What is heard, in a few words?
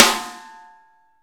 SN AMBIENT, Real Mega Drums